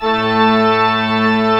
MANUAL A2, Pipe Organ; E-MU Producer Series Vol. 2 – More Studio Essentials; Keyboards

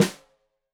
Snare Stereo, Drums; Deeply Sampled Drums

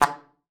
SLPB D3, ALTOSAX; Xsample VOL-2

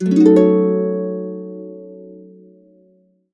HC EBDIM7, Complete Harps; USB Soundscan vol.62; AKAI

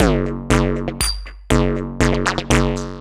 Resonated 808, Drum Loops

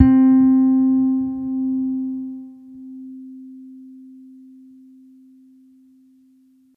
String Bass Electric c4 soft; TrashLibrary

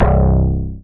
BAS FM DX-7

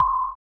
SONAR; Spectrasonics; Metamorphosis

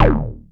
OB D#2 -L, Rare Synthesizer; Hollywood Edge - Giorgio Moroder Rare Synthesizer Collection; OB 4 VOX.

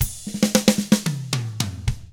POP ROCK, Double Platinum Drums; 109POP ROCK

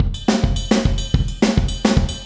180 roller, breakcore

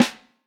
Vintage Snare Drum; Q Up Arts; Johnny.C's Vintage Snare Drum; 4X14 JCBOB

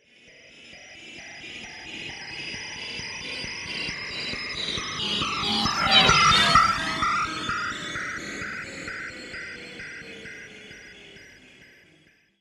satelit, Anime Sound FX, FLYOBJECTS

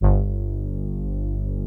P-10 TALK, PROPHET 10; Greytsounds VOL-1

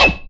Cheese; Zero-G Jungle Warfare 1, 2 & 3; 90 s Sample CDs

